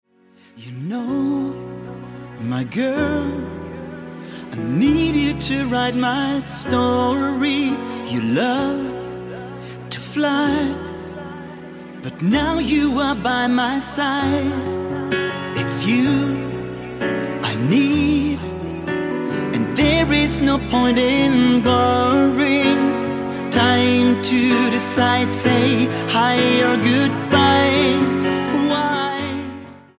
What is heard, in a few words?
acoustic piano version